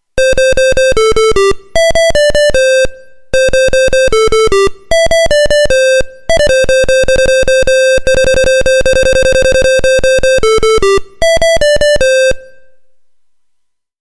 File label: Ringtone